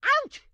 grandma short yell; Blind Drive

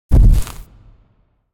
Footstep From Monster Dinosaur With Debris; Hollywood Edge; sound designer's toolkit v2

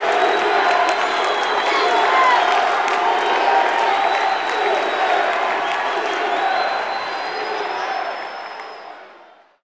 BBall Crowd Defense; Crowds

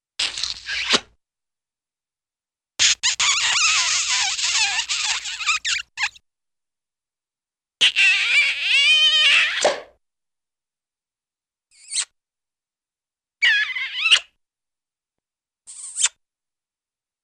Funny Wet Sucky Smacky Kiss, Cartoon; Hollywood Edge; Cartoon Trax

